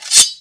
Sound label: sword get; Fate The Traitor Soul; ITEMS